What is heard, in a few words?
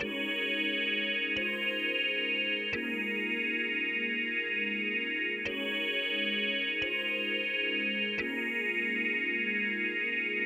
PAD, Hip Hop and R&B Drumloops; Best Service ProSamples vol.01; AKAI